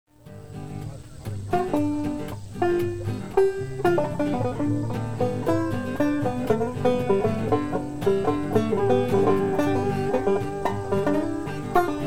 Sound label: Banjo Slide Dobro; Banjo, Slide, and Dobro part 1